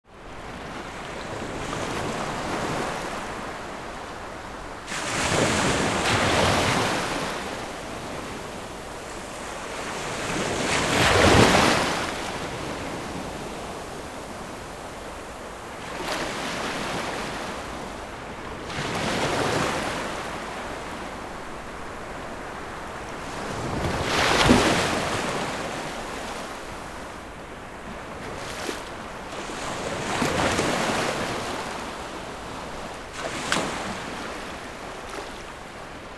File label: Beach ambience big waves ocean Malibu